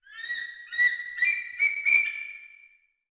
canyon eagles; ambient; World of Tanks Blitz

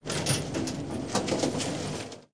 rocket reload

